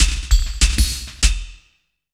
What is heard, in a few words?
WAGON, Industrial Loops; 98 WAGON